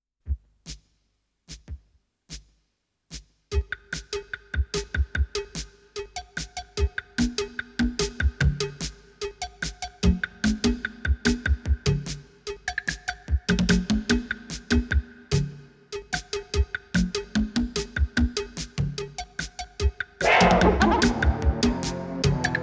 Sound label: concentric cacophony